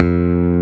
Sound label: bass